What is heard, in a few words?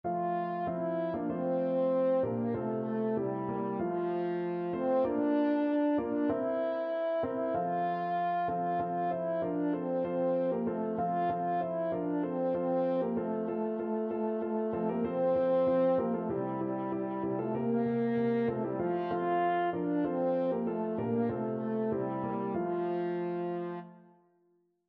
french horn